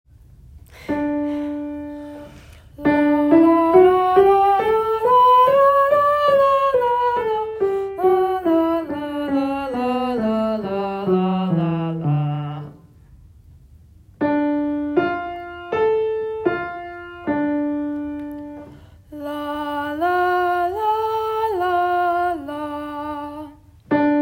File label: alto soprano; Audition